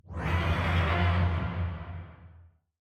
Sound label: Head Hurt; Silence Incarnate